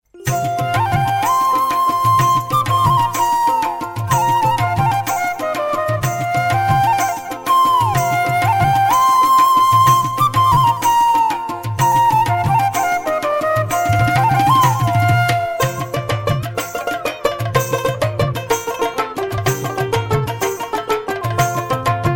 folk tone

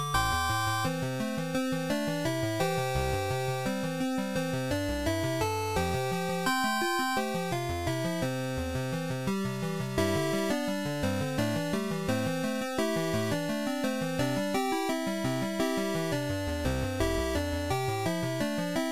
8 Bit

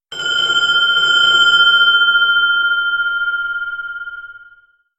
Small Resonant Bell

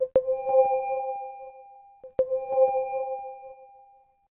Map Ping

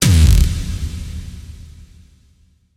Echo Impact

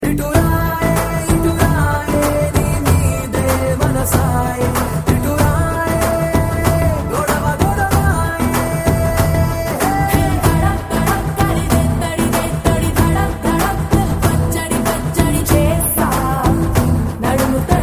sweet flute

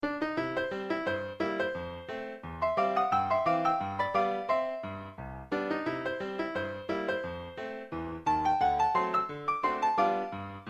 Blackberry tune

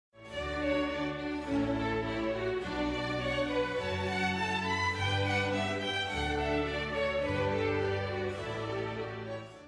classic message; classical

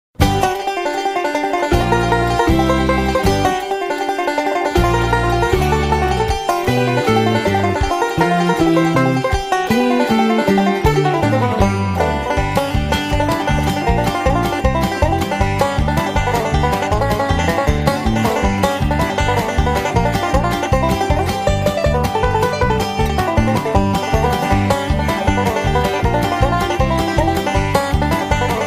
Bluegrass country

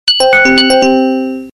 business ringer

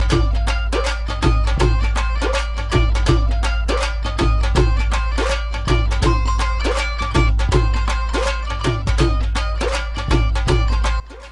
desi tone

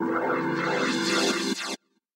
Sci fi sms tune

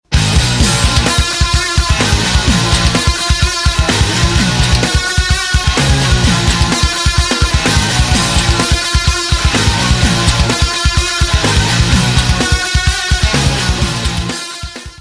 solo

